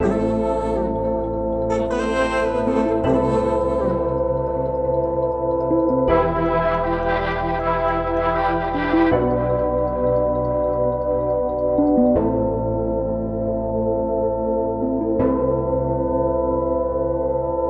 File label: Melody, 158 BPM E Min; Cymatics; 2022 Melody Collection